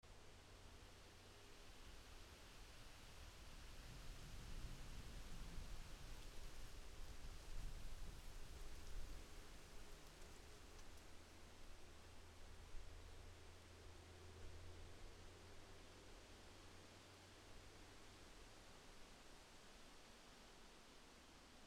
Windy Forest; Debarup Travel & Films

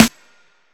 SNR, Snares; Percussion Samples; Drumkit

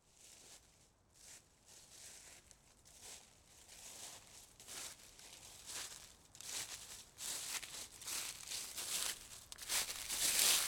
Walking In Forest, Ambient Sounds; Debarup Travel & Films

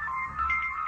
SFX; Percussion Samples; Drumkit

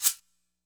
perc chair, Percussion; drumkit

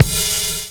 vinyl crash, Drums

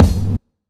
KICK SPACED OUT; drumkit

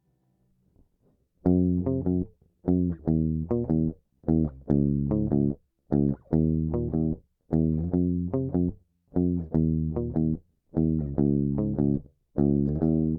Lead guitar

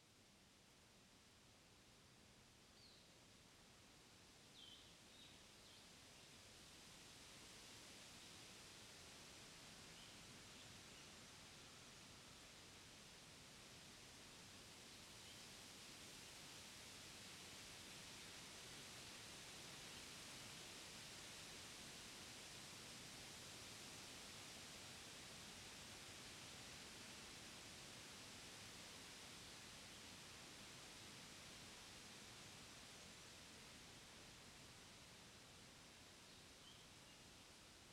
Spring Day Forest, Ambient Sounds; Debarup Travel & Films

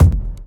Boom-Bap Kick; Kicks; drumkit

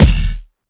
Kick Deep; Percussion Samples; Drumkit; Kicks

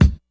grit, kicks; lofi kit; x.shulz lofi kit

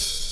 open hihat; lofi kit; x.shulz lofi kit

